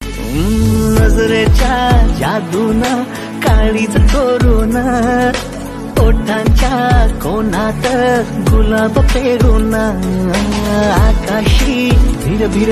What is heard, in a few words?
Love Feeling Marathi Song